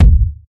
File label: tight low end steel kick drum a sharp key, Royality free kick drum tuned to the A# note